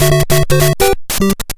Game Start Hurry; Super Mario Bros. 35

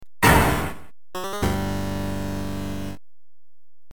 level start; prince of persia amiga rip

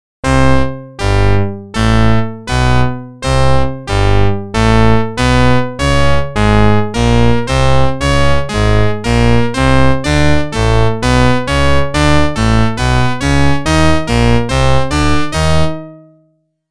Organ; NHL 94

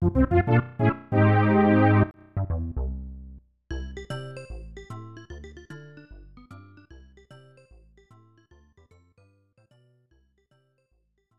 Tada; Heisei Shin Onigashima; snes

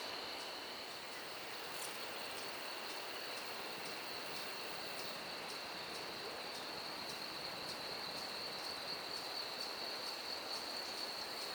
Ambience - day plains; Sim City Societies

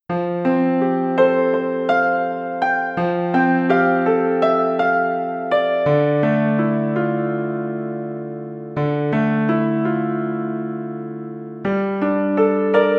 Piano Arrange; SummerDays; School Days

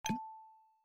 Chip Landing; My Cute Commissar

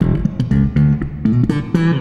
Bass wet

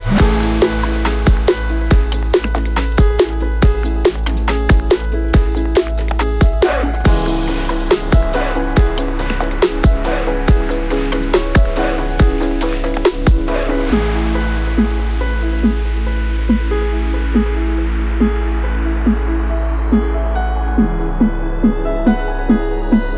League Unlock Music; gear club

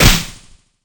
Punch short

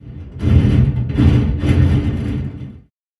random pipe

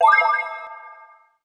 Ui Desktop Icon Click; pangya fantasy golf pc

